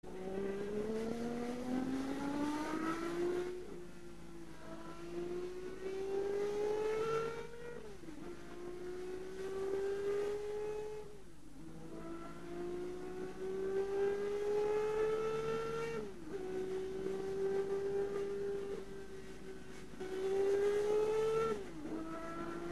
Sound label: Car sounds Matra MS 650 V12; Le Mans